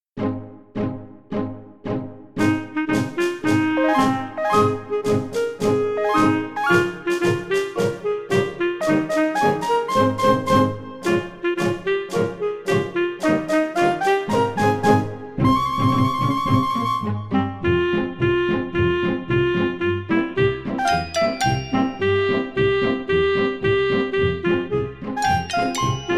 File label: pesnya komara minus